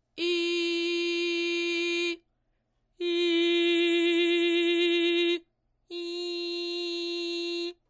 VOX Gola Verso Il Naso

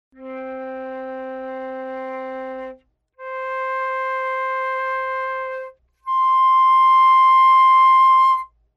Ottave

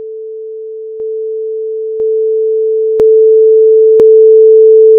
Progressione geometrica ampiezze

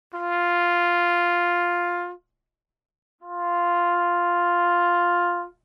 TR Direzione Microfono

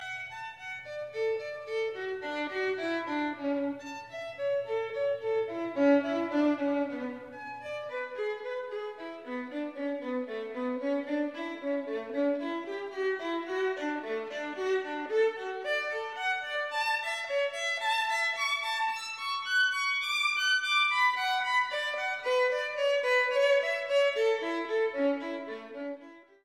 Bach ciaccona primo stadio

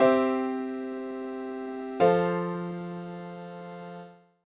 Cadenza perfetta

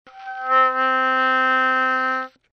Attacco Armonico

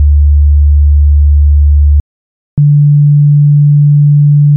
Timpano nota percepita

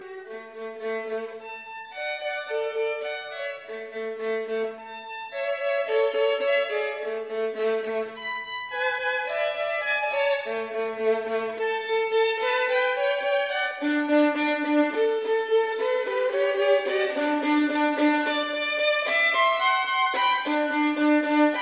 Bach ciaccona quarto stadio

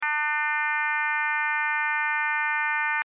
Suono armonico

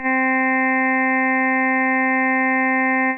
Suono armonico

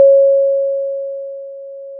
Corda freq

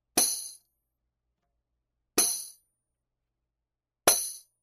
Tamburello Diversi Colpi

